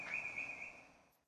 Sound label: morning birds, birds; annandale